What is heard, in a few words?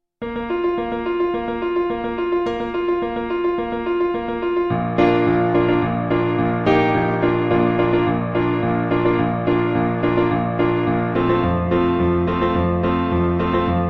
accompaniment; mamma mia vocal audition materials; KEYANO THEATRE COMPANY